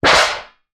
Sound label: tin lid down on floor; foley; zapsplat